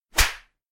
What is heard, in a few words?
scream kill